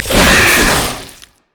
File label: spider attack; LoL